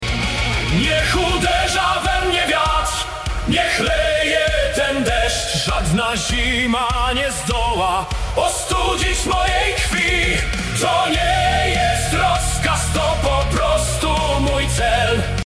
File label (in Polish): ring tone